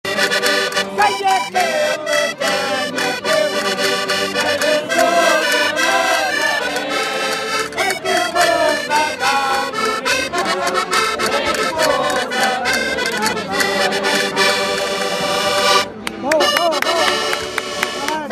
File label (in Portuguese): akordeon cantigas; Magusto